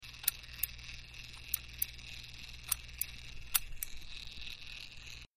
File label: Display Servo Hums C; servos